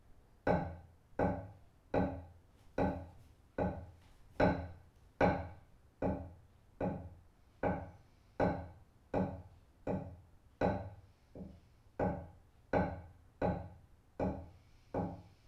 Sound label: 287 Hz wood tone without introduction